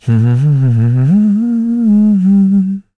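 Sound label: Vox Hum, Korean; heroes; Esker; kingsraid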